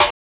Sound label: JUNGLE SNR, Drums; Distored Reality 1&2